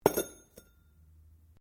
METAL ON STONE IMPACT GENTLE; Metal (Sound Effects:Materials)

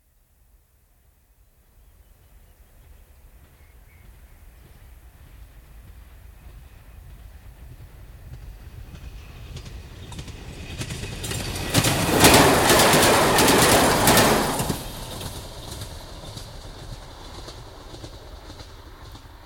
Diesel Railcar, high speed pass right to left; Trains; BBC

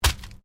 Organic stab; Various Organic Foley sounds; Stab And Slice; Combat; Stabs and Slices

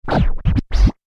Record Dj Scratch Spin; Dj Sound Effects; Blastwave

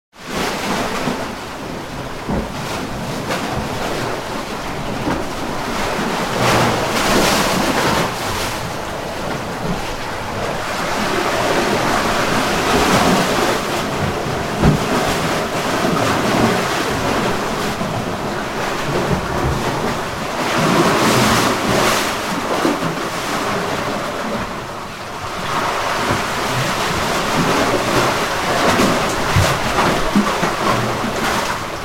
Sea In Cave, Swirling tide inside a cave.; Ocean

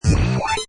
Hi Tech Panel Servo Button

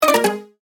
Multimedia Chime, Technology, Multimedia, Chime; BlastWave

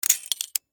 Steampunk Latch, Scifi, Steampunk, Latch; Science, Fiction; BlastWave

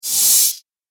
Rusty Old Metal Swish, whoosh; Whoosh Sound Effects

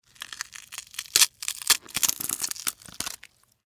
Flesh Rip; Horror; Blood and Guts; BlastWave

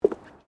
FOOTSTEPS WOMENS LOW BOOTS BOARDWALK STEP; Footstep Foley; Sound Effects:Human

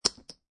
Poker Chips Multiple Dropping On Felt; Poker chip drop on felt: Multiple poker chips being dropped on felted table; Lynne Music

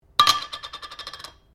JAR GLASS LID METAL DROP BENCH

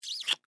Human Female Kiss Smooch; Kissing (Sound Effects:Human)

